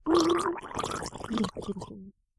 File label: female drown death; zps; Humans